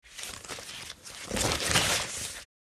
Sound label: Gas mask taking off; military equipment sound effects